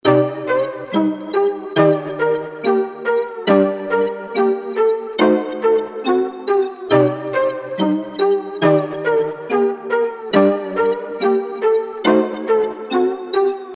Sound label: Melody Loop